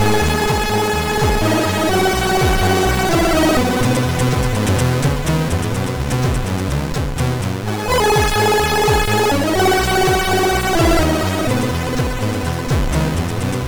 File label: Intro, MOS6581R3; Intro 2; hvsc; Luca